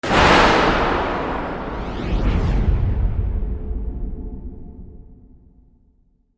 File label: Warp Out